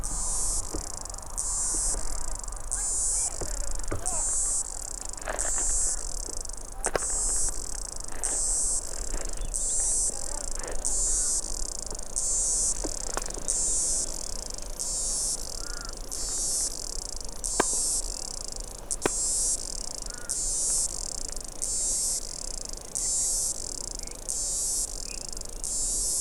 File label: tigris